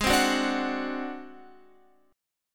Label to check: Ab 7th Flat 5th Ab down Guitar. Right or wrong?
Right